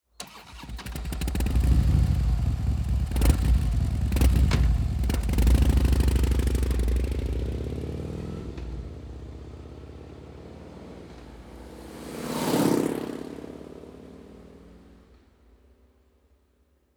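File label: softail v strike closed, exhaust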